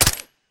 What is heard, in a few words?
shoot; emx atlas